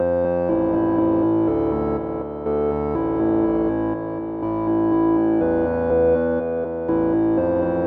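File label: 122 BPM Melodies C major A minor Tonic A; mmb studio melodic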